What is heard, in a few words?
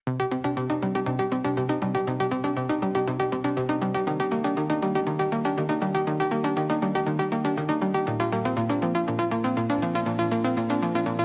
Electronic Impulse